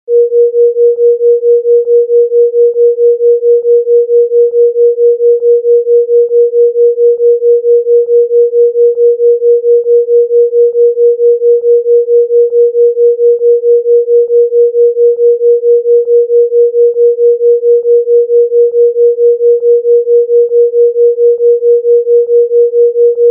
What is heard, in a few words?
Pulse